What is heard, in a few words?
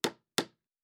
Nail; Fantasy Sounds Bundle; Craft